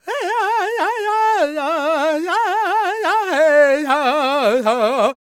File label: C# MIN, World Voices, LAKOTA